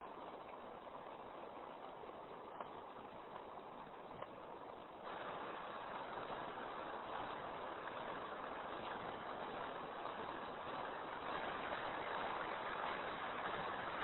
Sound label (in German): applaus